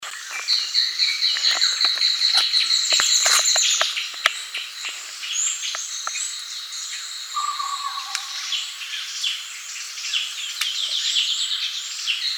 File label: nuthatch vanga